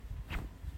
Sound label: Sword Swing